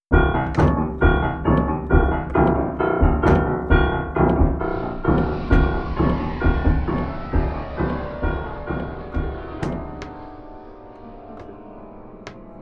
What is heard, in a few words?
Piano FX, schimmel upright